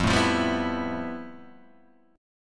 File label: F Major Sharp 11th; strummed; JGuitar